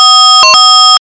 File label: check ok